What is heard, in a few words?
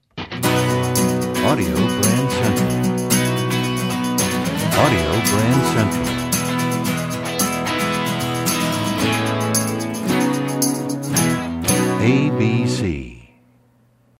Desert Wind, radio jingles